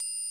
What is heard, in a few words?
classic hip hop Triangle; STYLE KITS (Hip Hop, Etc); Classic Hip Hop Mega Kit